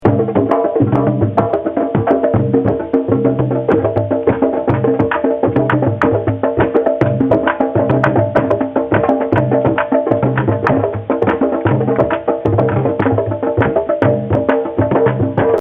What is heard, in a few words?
candombe